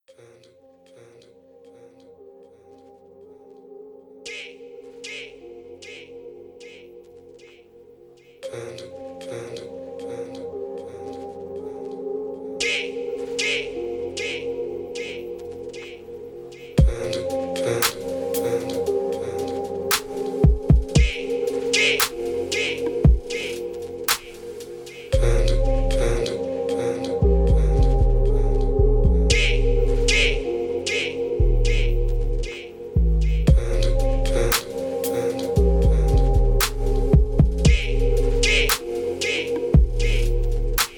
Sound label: BEATS